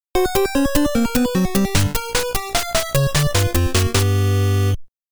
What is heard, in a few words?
game over BGM; konami famicom chronicle vol.1 disk system edition